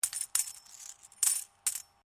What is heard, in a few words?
Coin penny drop penny sequence, Old Australian pennies; Money; Objects